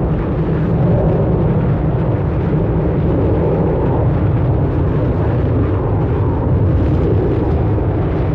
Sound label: Aircrafts Engines Plane Far Noise; DCS